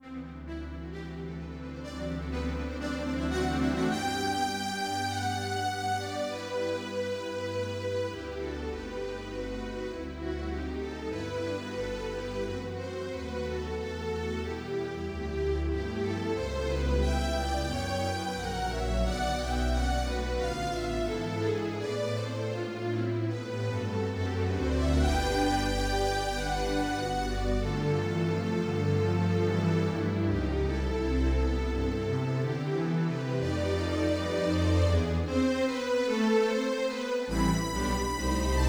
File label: strings, Yamaha NP-30; Demo Songs